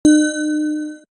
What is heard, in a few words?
Notifier; BlackBerry OS-4.6